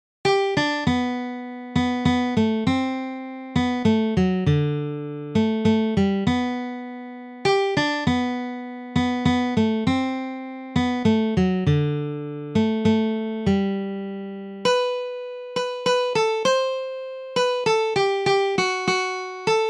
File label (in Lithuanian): gitara